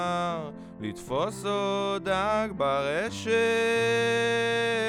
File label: Lead B